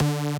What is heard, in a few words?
bass